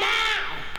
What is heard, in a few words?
VOC